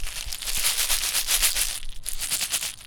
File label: Shaking Box of Screws; BB Retail Therapy Sample Pack